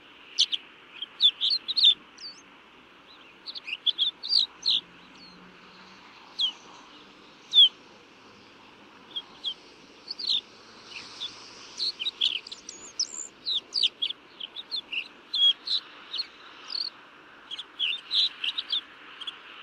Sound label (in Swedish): Snosparv